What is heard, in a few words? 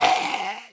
Mummy Attack; Playable Tutorial Level